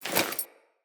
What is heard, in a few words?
Foley Action Default Melee Swipe